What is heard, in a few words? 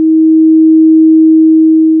pan const power